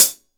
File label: sparkle hat sample e key